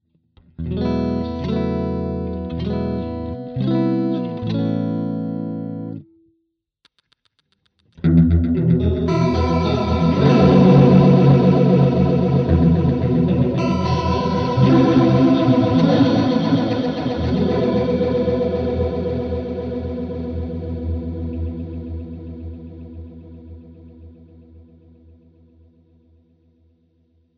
SPACE BENDER x10 ENV HI

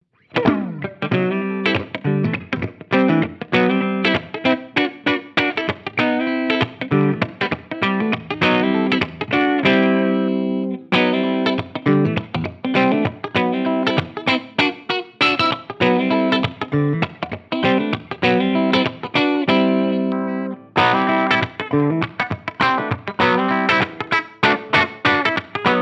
BC Rich Mockingbird Varitone 3 Way Switch

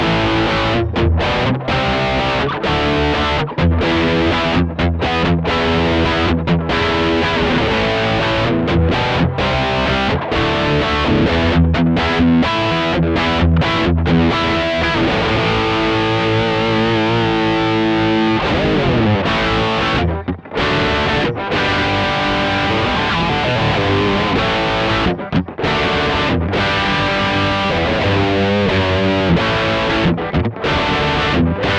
Highgain Rhythm; Profiling versus Capturing

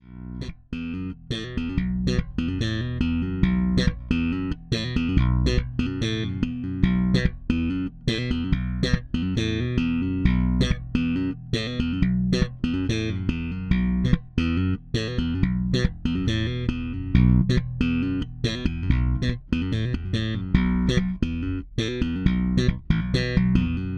Sadowksy NYC Jazz Slapbass aktiv

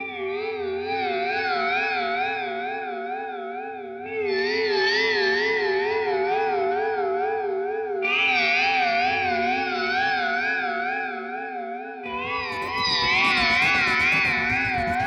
Delay Reverb Pedal; Minim

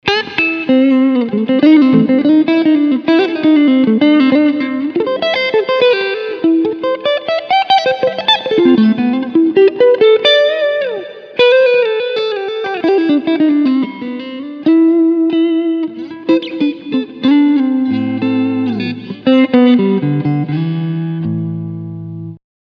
Clean Front SC

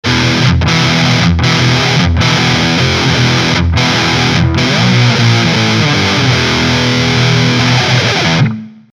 Jackson DK2 Bridge dist

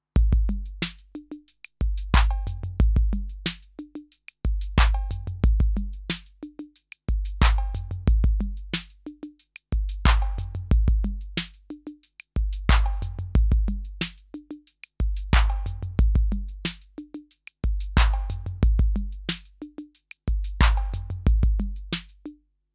Loop RD-8

